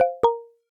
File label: agent Connected